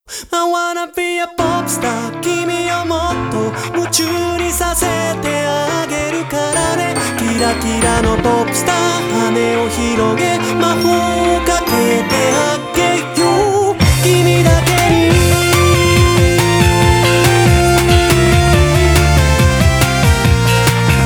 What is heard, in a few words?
Pop; Taiko no Tatsujin 8 2006; PS2